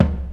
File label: sharp tom sound c sharp key, Loudest frequency: 277Hz